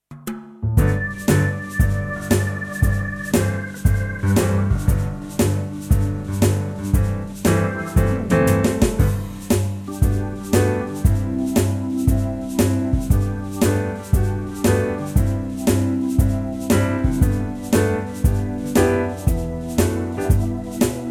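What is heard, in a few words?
G, Backing Tracks for Male Vocals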